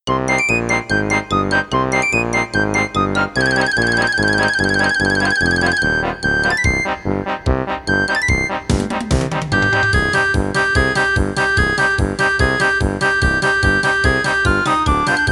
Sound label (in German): zirkuslieder playback